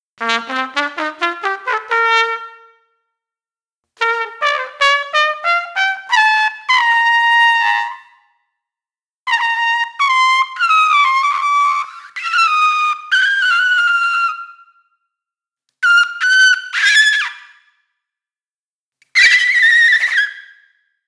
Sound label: lead high note